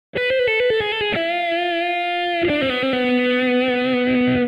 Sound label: lick; fretjam